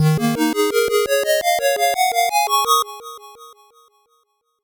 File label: 8bit jingle slow